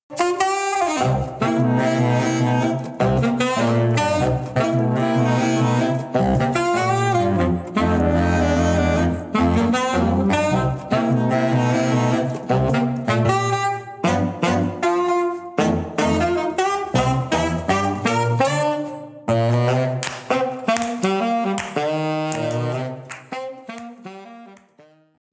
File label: Sax